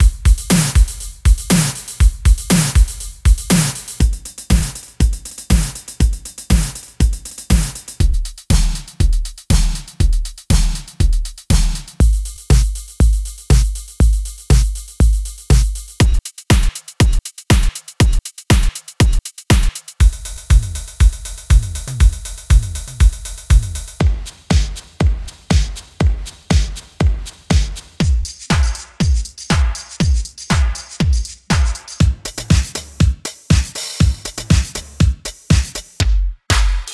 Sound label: disco house beats